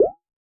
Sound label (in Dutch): Ploep